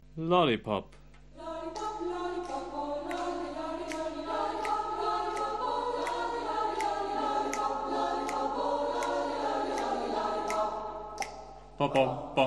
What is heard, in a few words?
Bass